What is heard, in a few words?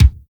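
thud kick; MR THUD; NATUR. K1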